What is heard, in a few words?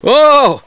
sci fear, scientist